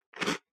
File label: bite